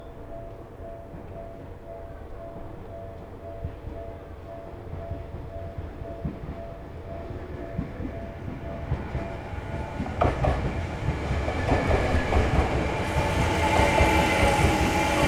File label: train